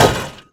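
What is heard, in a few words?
metal pole med, Impact; Cars; XBOX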